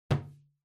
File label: Balloon Slap